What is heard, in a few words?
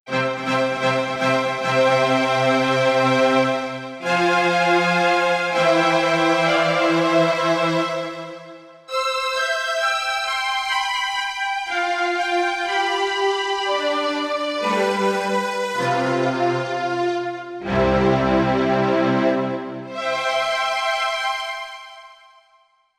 Bright Str., Korg MSC-1S; Korg MSC-1S Variety